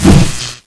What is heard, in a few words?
hammer draw, knife; Buben4ik; lycanhammer